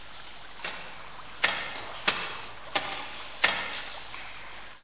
sewer; Broken Sword 1 - The Shadow of the Templar (CD, Spanish); ScummVM

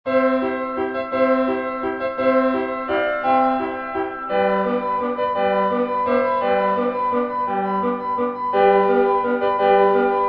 Out Of Tune Piano Waltz; easyrpg; WitchsHeart

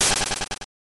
Flee; Pokemon Essentials; Pokemon Iberia V1.03

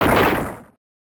Rock Smash; Pokemon Essentials; PKMN Estelar 1.3

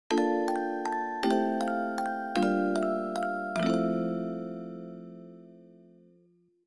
jingle; WitchsHeart; easyrpg; maoudamashii

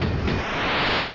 Sonicboom; Pokemon Circon BETA 2 v1.8; Pokemon Essentials

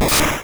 Battle damage normal; Pokemon Essentials; PKMN Estelar 1.3

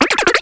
Cry; Pokemon Essentials; Pokemon Iberia V1.03

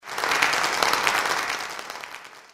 clap; easyrpg; Ib

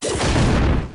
Mega Punch; Pokemon Essentials; Pokemon Circon BETA 2 v1.8

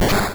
Battle damage weak; Pokemon Essentials; PKMN Estelar 1.3

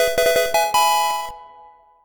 Key item get; Pokemon Essentials; PKMN Estelar 1.3

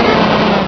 Cry; Pokemon Iberia V1.03; Pokemon Essentials

